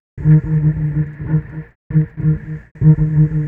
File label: Bass Cello; Sonic Foundry; Sony Creative Software; Stylus Pressure Urban Grooves on Digital Wax; What Up